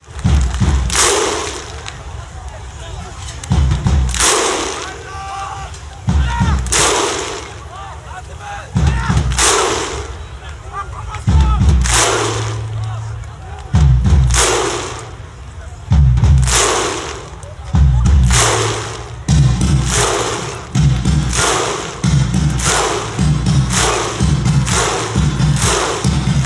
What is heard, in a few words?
viking clap, fan chants